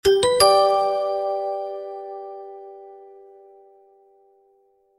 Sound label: win